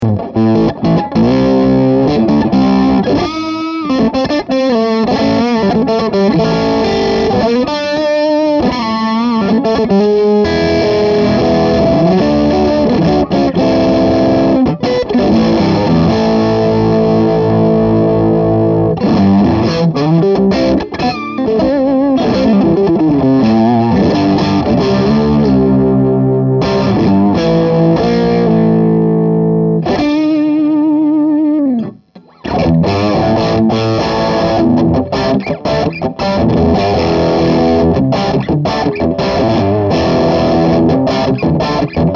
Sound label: Mo Gain Single Coil